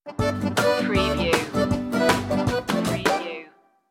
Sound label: zydeco stinger